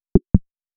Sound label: alert